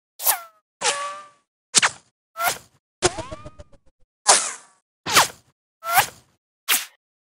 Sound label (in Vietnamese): am thanh vien dan bay hoat hinh vui nhon